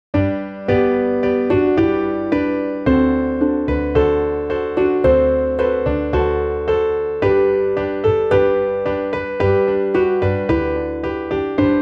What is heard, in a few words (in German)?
midi klavier; winters abschied